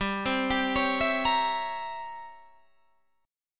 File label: C 7th Flat 9th G